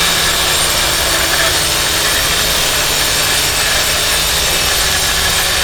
rammus powerball; robo rammus

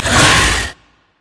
spider attack; LoL

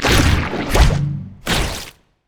emote recall; LoL; zac base

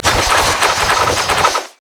heartseeker spear; pantheon

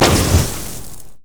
grasping roots; Zyra; hellfire

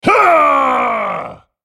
spellcast effort

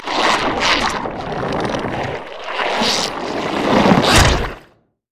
elastic slingshot; LoL; zac base

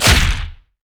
basicattack dragon; LoL; shyvana

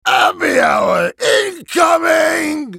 attack; OktoberGragas; Character Voices - English

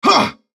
effort

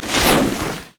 basicattack; Ziggs; snowday

